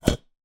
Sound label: Short, vase